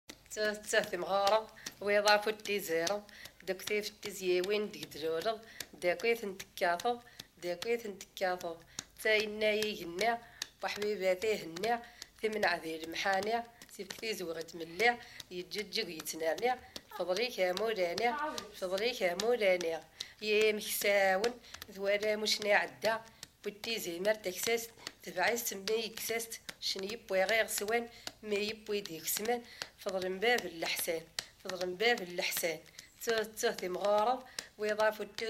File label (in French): Sauteuse kabyle enfance musique; les 4000